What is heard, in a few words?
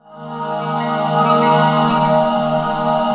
PAD, Drum & Bass, 150 BPM; Ueberschall; Drum & Bass Resonance